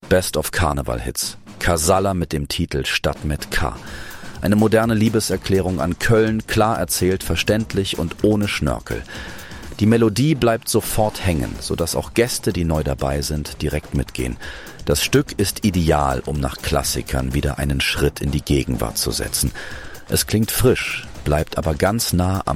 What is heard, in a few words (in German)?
Best of Karneval Hits; karneval fasching